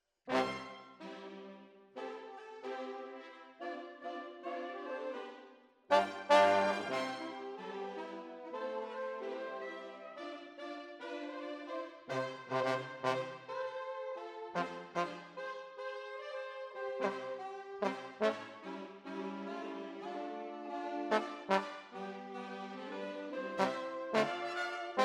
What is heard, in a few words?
Trombones, jazz for piano